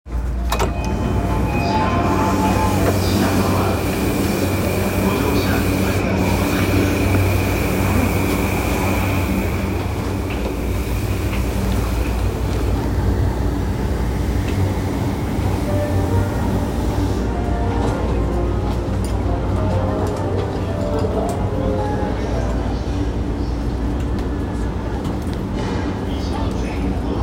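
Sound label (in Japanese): 山手線品川 counterclockwise, train sounds; JR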